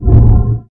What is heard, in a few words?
Control Sound; Unreal Tournament